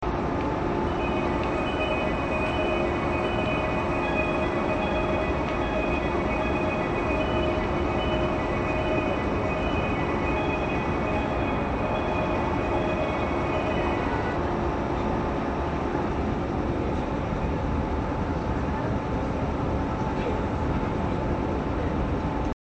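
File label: fuzishiro, departure bell; zyoban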